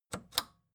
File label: record player power click off slow